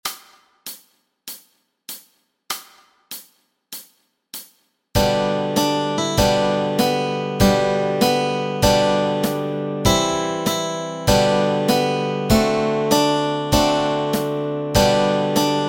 Up on the Housetop melody and chords

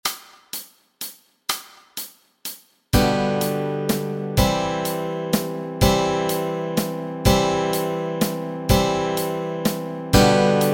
chords, Easy Guitar and

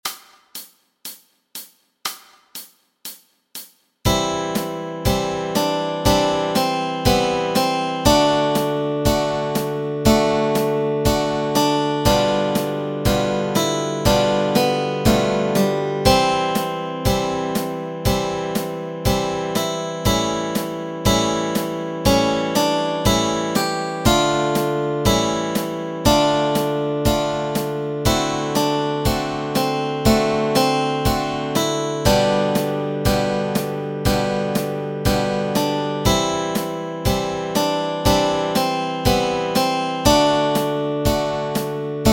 Melody and Chords Track

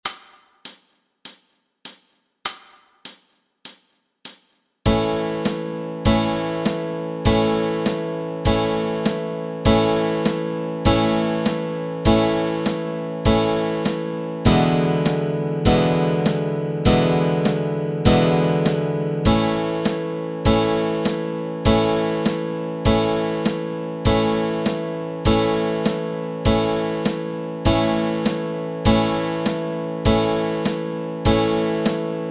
chords, Easy Guitar and

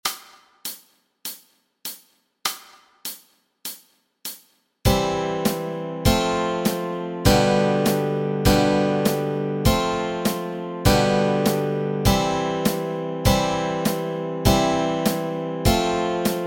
Ding Dong Merrily on High chords

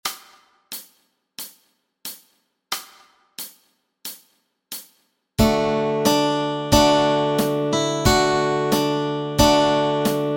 melody and chords, Easy Guitar and Ukulele

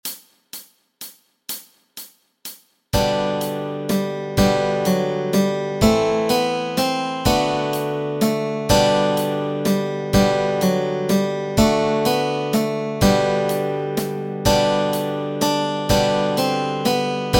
melody and chords

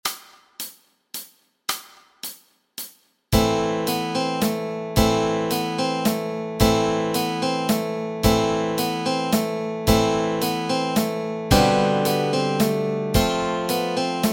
Carol of the Bells melody and chords

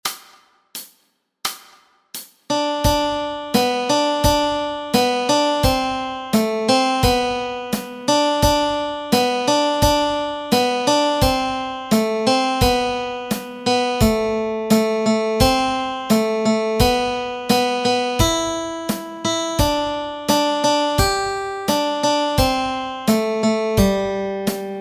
melody

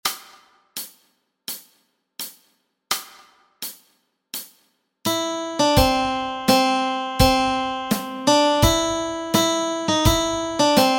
melody

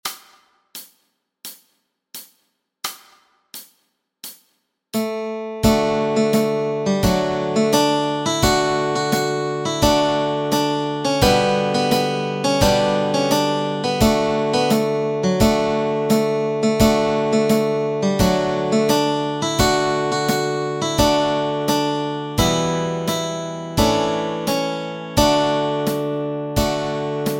melody and chords

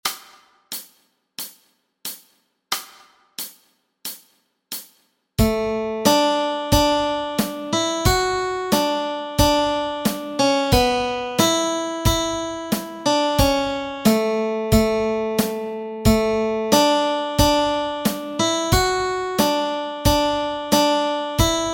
melody

